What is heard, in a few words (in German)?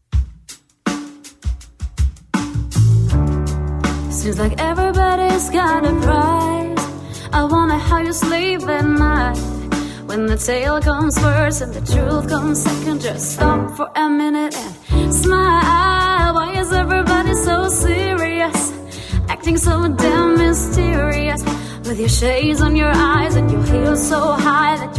Quartett, Groove